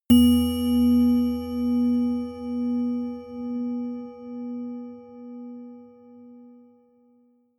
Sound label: clock strike